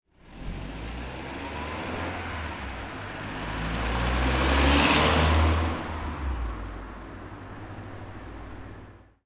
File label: Car Driving